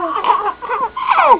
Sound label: baby laugh